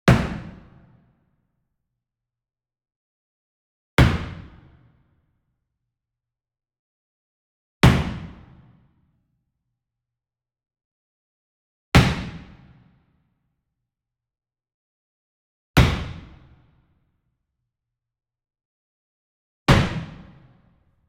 Sweetener Wood Mallet Corner Hit Medium; boom library; Cinematic Strikes Construction Kit

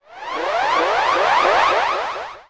ALARM; XXL 800 Production Effects

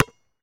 Street Ball Bounce Blacktop, Street Basketball; SFX Kit

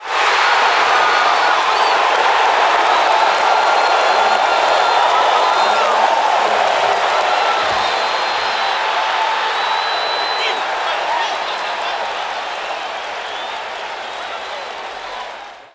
BMX Crowd Cheer Large; Crowds

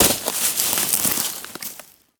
Bodyfall Weeds; SFX Kit; Bodyfalls

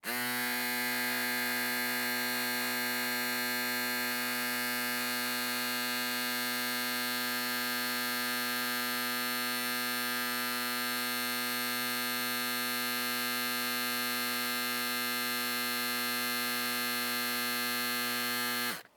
electric shaver; devices accessories utilities; NS Studios - ultra sound collection

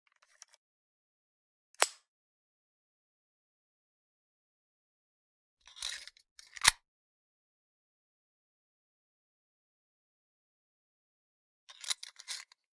.22 Pistol Chamber Round, Magazine Insert; Hollywood Edge; Heavily Armed

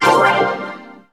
Pickup Magic; SFX Kit; Pickups

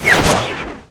Rocket Launcher Shot; SFX Kit; Weapons

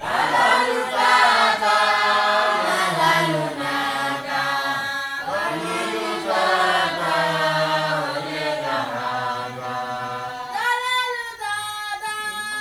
africa chant benin; best service Blue Box

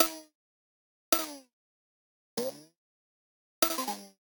Arcade Click Negative

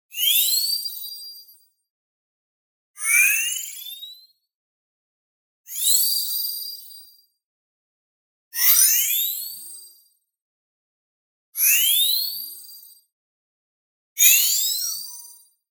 BLING Rising Frequency; boom library; Anime Essentials; DSGNMisc

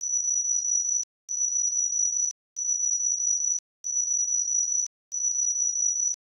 Scanner, Sci Fi; 1001 Sound Effects

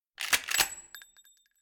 ShotGun Shell; Blind Survivor

